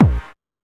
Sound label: Techno, EMU XL-7